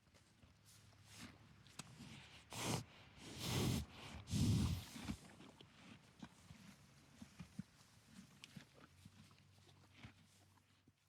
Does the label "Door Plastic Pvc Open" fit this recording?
No